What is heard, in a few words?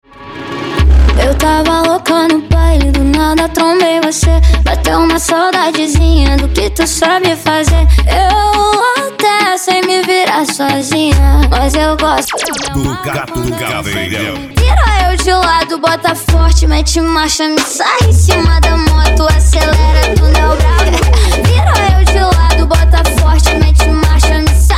funk mega bass